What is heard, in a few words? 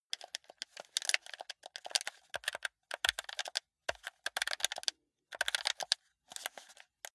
console controller play sequence lively long